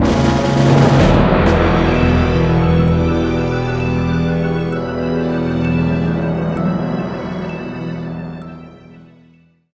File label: Negative (Fanfare); super mario strikers gamecube; Gazette